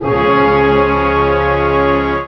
a#2, Orchestra, AKAI S-612 + S-900

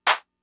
Clap, Fricke MFB-522, Drum Machines

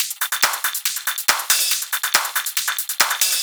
drum Loop 140BPM Full

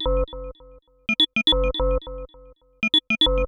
GLASS FX, Techno 138 BPM; Best Service ProSamples vol.54; AKAI

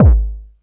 DIST. KICK

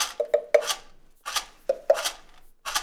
Roots Reggae; Sounds Good - Roots Reggae

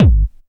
techno 2, bassdrum

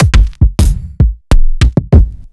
808 trap Kick

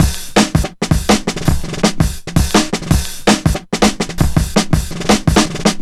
165 flam, Drum Loops, Drum & Bass; Total Drum&Bass

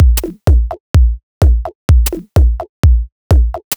Minuscule C 127bpm, House Drumloops; 90 s Sample CDs; Zero-G House Fabrik Drumloops; ZG Drumloops

